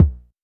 Polite kicks A#1, Short; LIMAT Div Dept; Drums

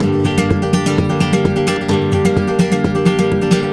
130 G Rumba; Spanish And Gypsy Traditions; Soundscan vol.59; AKAI

